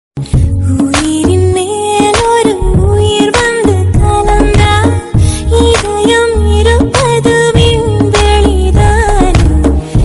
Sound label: Tamil trending bgm Ringtones